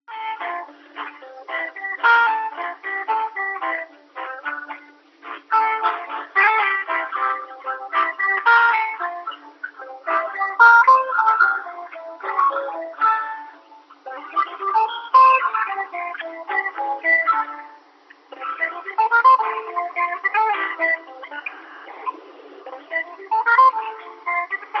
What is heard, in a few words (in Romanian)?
muzica; muzica-telekom.opus